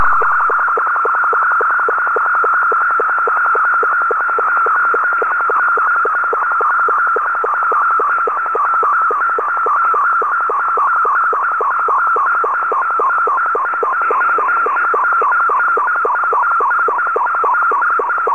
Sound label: Sstv